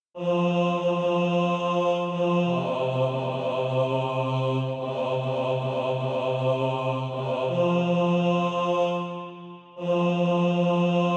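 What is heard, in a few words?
bass, SATB Practise Music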